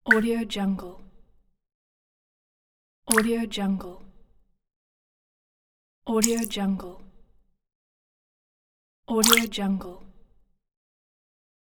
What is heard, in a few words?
clicks